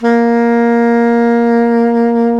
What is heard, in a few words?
SAX SOP MF, SAX Soprano Long